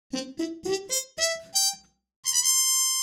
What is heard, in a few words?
Cartoon victory